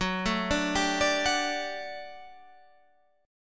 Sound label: G Major 7th Suspended 2nd F sharp